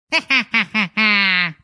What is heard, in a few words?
Emote Laughing Goblin